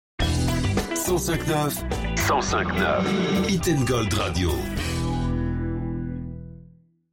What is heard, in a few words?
Sweep Funky Hit gold radio